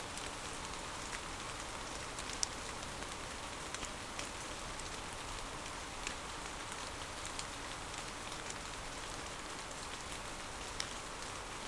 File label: mainly rain